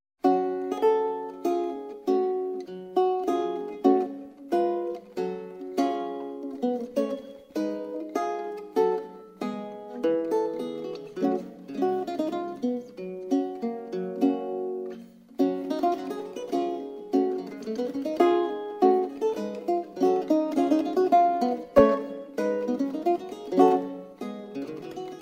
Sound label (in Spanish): laud; La galatea, II